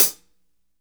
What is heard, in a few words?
HH CL R, Drum 1 Dry; East Collexion